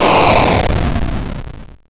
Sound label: explosion